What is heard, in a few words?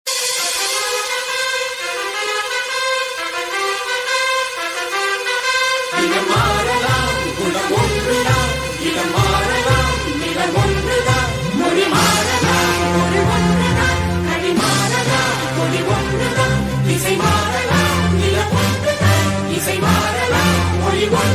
Theme hindi ringtone; Flag Theme; Roja